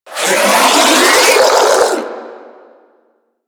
Melee Mid Spin Scream

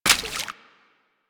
Steps Water, footsteps; Foley; Warframe; Grineer Royal Jester

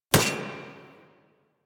Rising Blades Sword Impact; Warframe; Warframes; Pax Duviricus

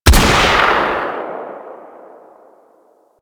Sniper Rifle; Weapons; Female Sniper Rifle; Grineer

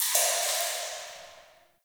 Cave Ocean Drip; Ambience; Midrath Island

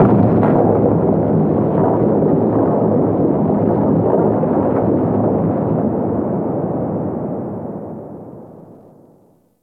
Ice Distant Ice Break Large; Warframe; Corpus Ice Planet

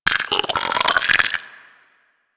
Arachnoid Vocal Grapple; Enemies; Vocals; Warframe; Corpus; Arachnoid Hunger

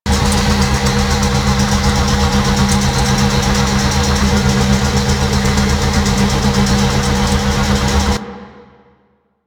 Ship Cargo Tram Loop; Warframe; Corpus Ship

